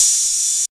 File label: soft open hat a key, Royality free open hat one shot tuned to the A note. Loudest frequency: 7729Hz; open hi hat